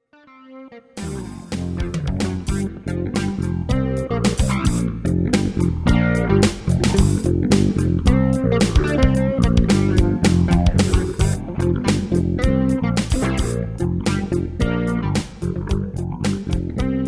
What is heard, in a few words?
riofunk